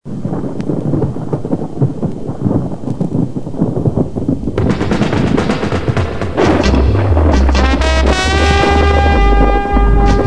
horns

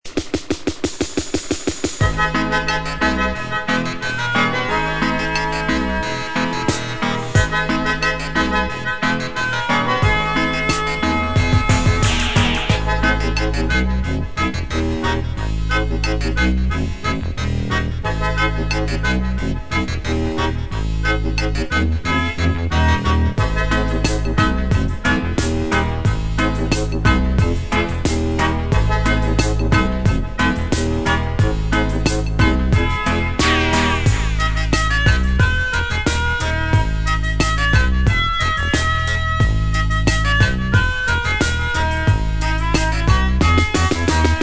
melody

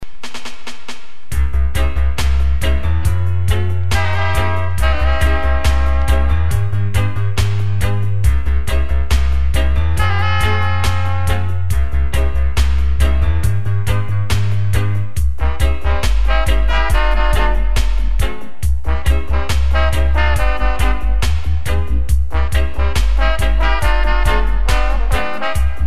firing horns, Dub horns

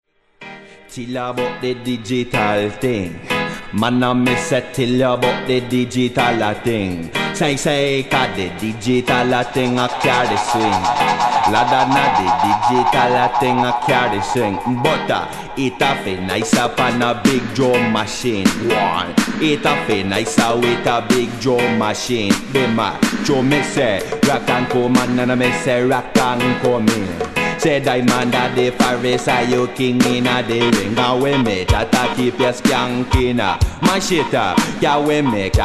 big drum machine

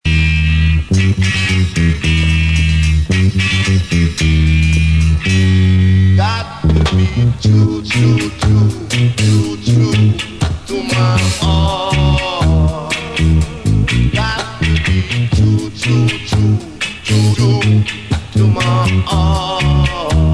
flute

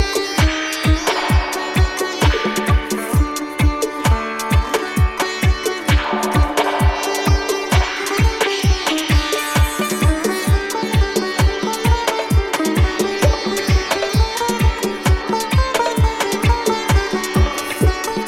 raga beat

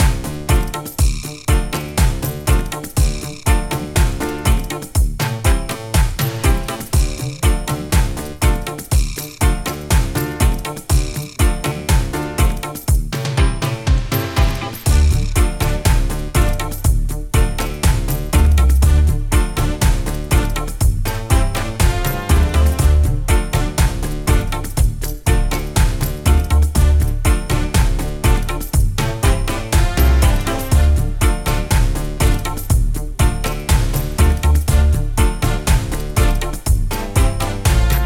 tabla march

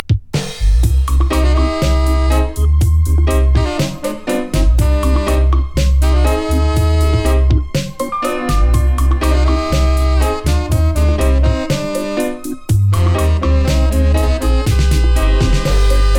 horns

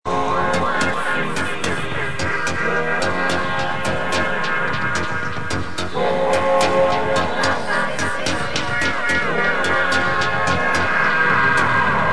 dub; hard dreamy dub

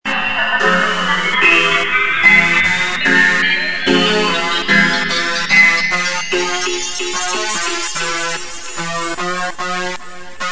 roots attack dub

